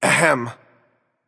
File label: nv clear throat; smite; voicepack; mercury